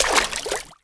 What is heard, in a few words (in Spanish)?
piedra al agua